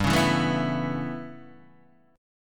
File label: A 9th G, Guitar Standard